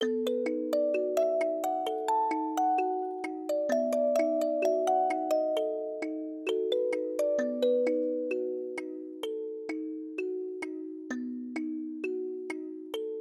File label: Music Box